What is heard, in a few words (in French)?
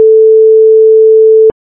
sonnerie tel